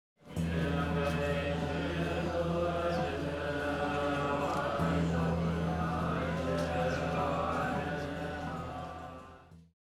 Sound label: buddhism bell 3d